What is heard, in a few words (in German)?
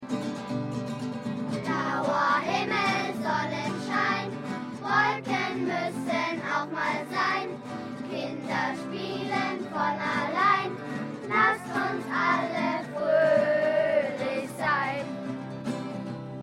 Klingelton